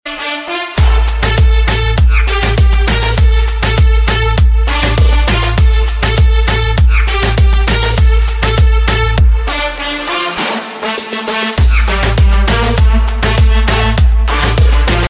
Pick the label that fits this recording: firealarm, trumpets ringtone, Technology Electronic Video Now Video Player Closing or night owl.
trumpets ringtone